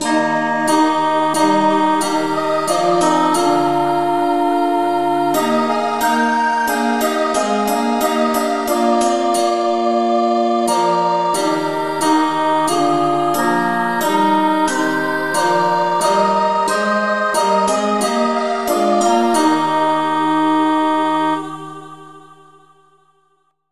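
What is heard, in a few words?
choir key E flat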